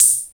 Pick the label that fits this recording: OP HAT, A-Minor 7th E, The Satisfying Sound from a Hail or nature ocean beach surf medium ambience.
OP HAT